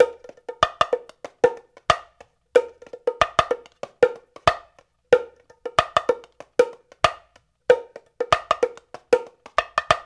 Tonga HipHop

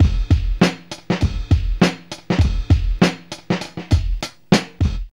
PARTY BEAT; Zero-G; Funky Elements

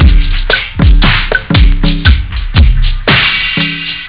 breakbeat; labrat; jazz jackrabbit 2

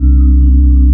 bass, 170 BPM, drum & bass; Ueberschall; Drum & Bass Flash Trackz

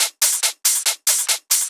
Loops 140 BPM Hihat Percussion Loops

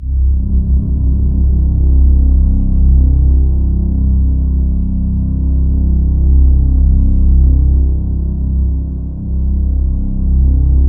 C3, Synth Leads

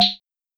Conga Lo, Korg SR-120; Drums

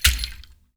HAND DRM, Heart of Africa; Spectrasonics

